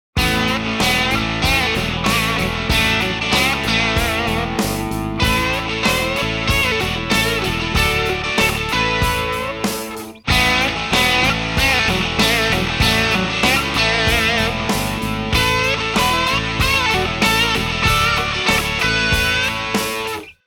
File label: Fender Twin Jensen